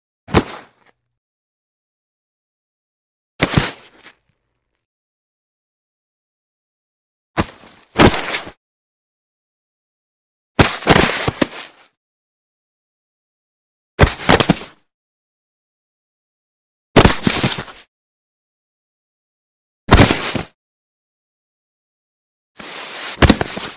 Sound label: Body Falls On Ground Light Body Fall & Scrape; Hollywood Edge Foley Sound Library